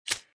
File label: Crab Claw